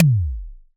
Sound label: tom, Casio VZ10M Drums; Polyend; Simulacrum Sounds; 1 Shots; Synths